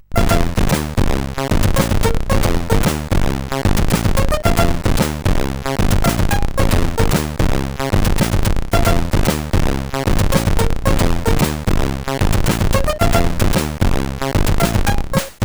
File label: tune CSG8580R5; hvsc